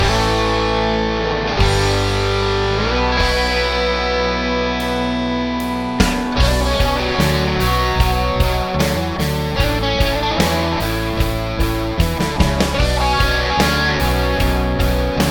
lead

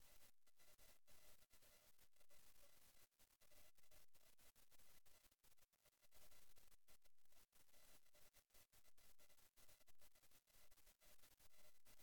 static; e-mu tracker pre static noise problem

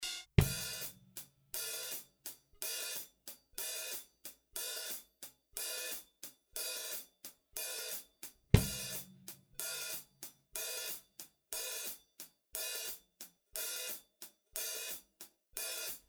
just drums

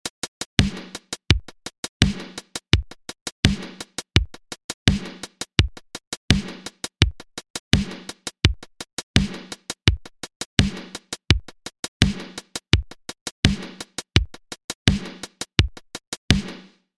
hats suggested sidechain, synthwave electronic

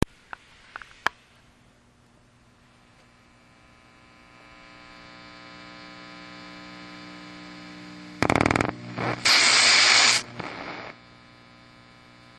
champ clone problem sound

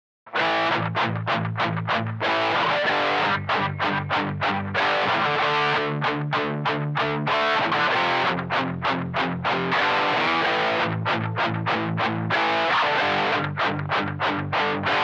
emulation

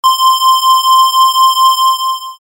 square; sound i am looking for

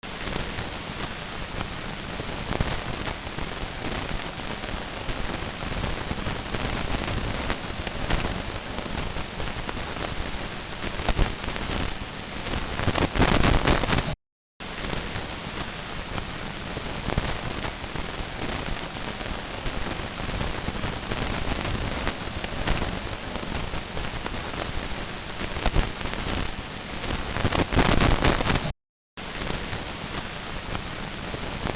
sound, microphone is making alot of background

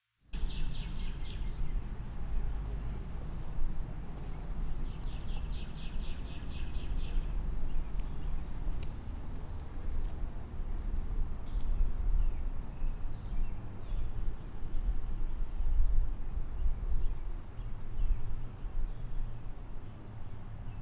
h4n external mics w nr, hissing white noise

